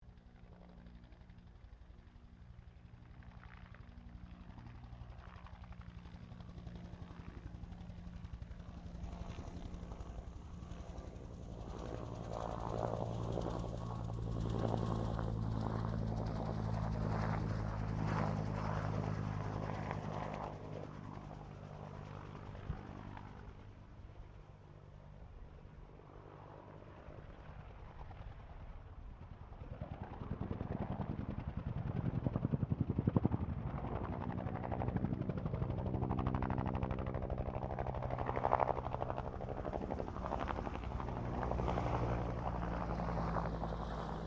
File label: HELICOPTER BELL UH1 IROQUIOS PASS; RAN UH-1 Iroguios; Helicopters; Sound Effects:Vehicles